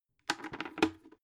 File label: bucket handle lift up